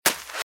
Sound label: Footsteps Sand Dry Run Left; RadwinIsland